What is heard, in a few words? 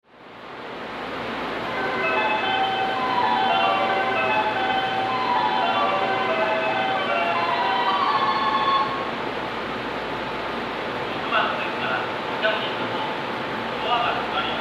tsudanuma 6 melody; jreast; sobu